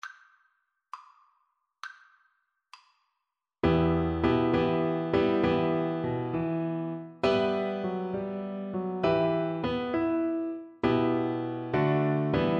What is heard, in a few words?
upon a summers day, Viola